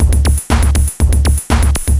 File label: electro 120